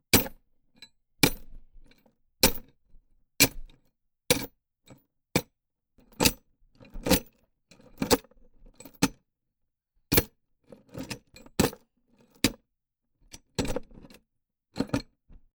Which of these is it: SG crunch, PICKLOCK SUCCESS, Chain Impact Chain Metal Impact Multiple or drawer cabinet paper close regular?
Chain Impact Chain Metal Impact Multiple